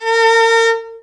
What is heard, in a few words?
VIOL A#3, VIOLON, Arabian Traditions; USB Soundscan vol.43; AKAI